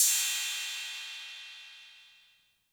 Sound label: Cymbal 808 Color